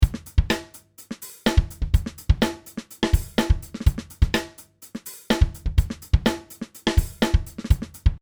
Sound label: Nu Jazz 125